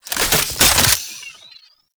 Reload, Weapons; Warframe; Cedo Prime

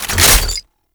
Reload, Weapons; Warframe; Corpus; Tenet Quanta

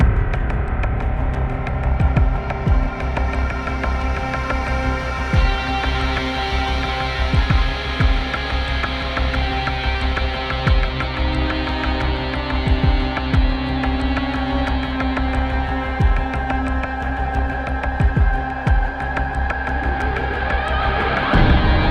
Login Music; Login Screen; Veilbreaker

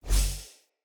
Casting Device Block Loop Start; Weapons; Player

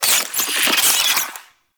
Infested Ashen Vocal Attack Death; Warframe

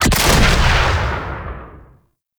RPG Shot; Warframe; Grineer; Hellion Elite

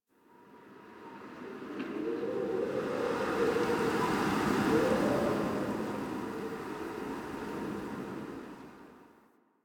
Exterior Winter Wind One Off; Warframe; Ambience; NineteenNinetyNine

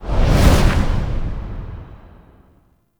Hive Queen Whoosh; Warframe; NineteenNinetyNine; Stage12PartyOfALifetime

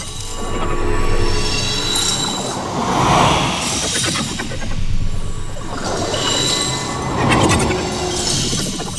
Boon Fragment Loop; Pickups; Duviri

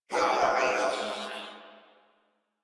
death crewmen; Warframe; Corpus Crewmen; Crewman B

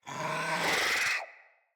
Infested Charger Run Breath, Enemies

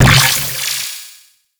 Target Decoy; Warframe; Sanctuary Target

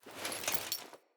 Foley Walk; Friendly; Jotar

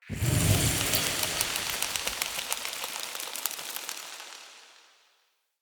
Bow Butterfly Dissolve; Weapons; Player; Bows; Fey Thorn Bow

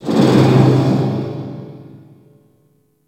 Infested Distant Metal Groan; Warframe; Corpus Infested; Ambience

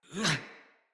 Attack, Battle Barks; Envoy

